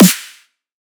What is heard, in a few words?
snare hard